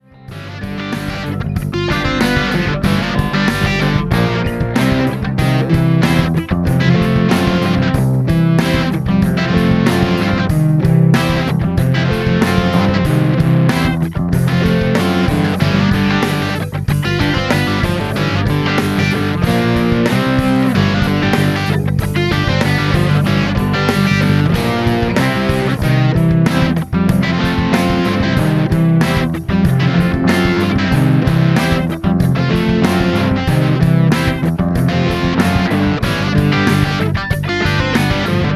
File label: riff sketch